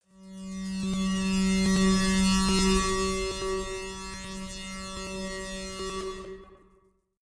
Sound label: tonal flyby, sweeps swells; comedy-cartoon; soundpool